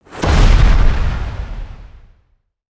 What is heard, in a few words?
Stun Impact; creatures organic